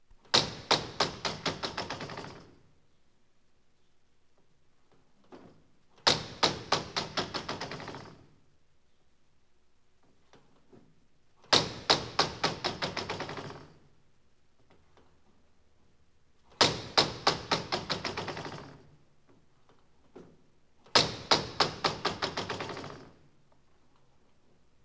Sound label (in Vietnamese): tieng nem qua bong tren mat dat va bi bat len